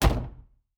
Shield Wood

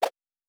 Whoosh; Fantasy Sounds Bundle

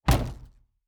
Shield Wood

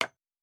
Nail; Fantasy Sounds Bundle; Craft

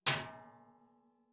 Metal Hit; Fantasy Sounds Bundle; Medieval Combat Sounds

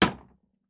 Wood Impact; Fantasy Sounds Bundle; Craft

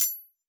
Jewel UI; Fantasy Interface; Fantasy Sounds Bundle

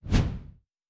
Whoosh; Fantasy Sounds Bundle

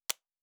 Cards Place; Fantasy Interface; Fantasy Sounds Bundle

